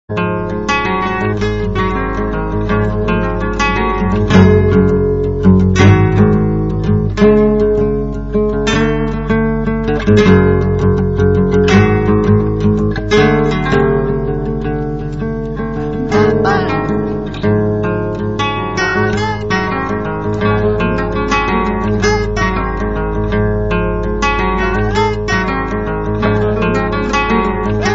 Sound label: Guitar Jam